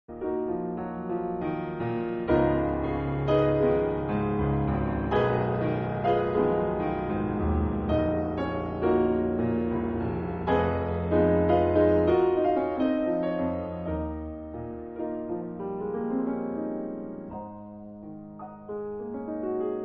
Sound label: folk